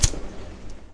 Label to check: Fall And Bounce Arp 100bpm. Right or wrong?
Wrong